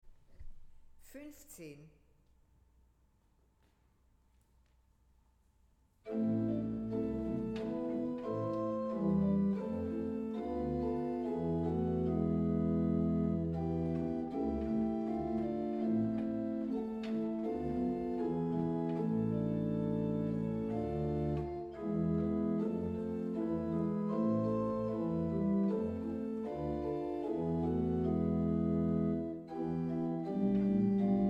Weigle, gebrauchtorgel

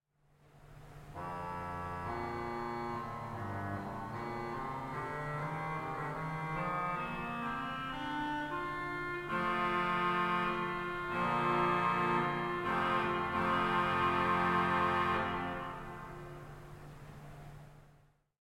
Orgel, pfeifenorgeln; SW-Dulcian 16'

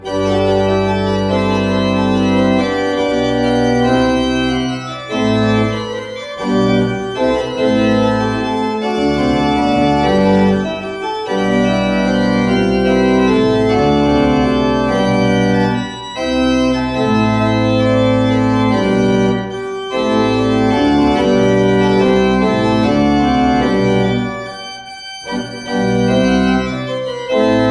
Walcker, gebrauchtorgel

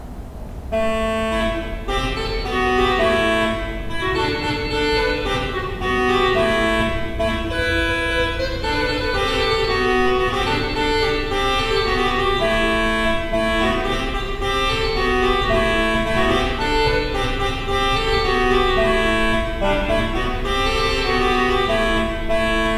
Bosch Raupach, gebrauchtorgel

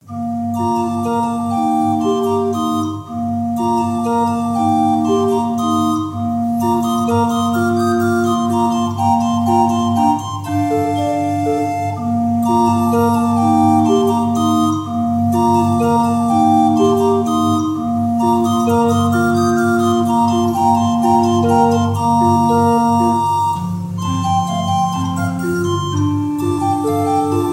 Oehms 6 II P, orgel

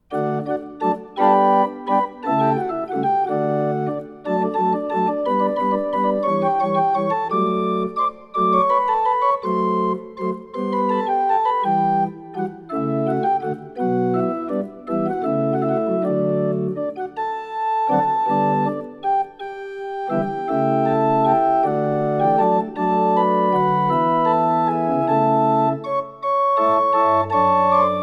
Schmid, gebrauchtorgel

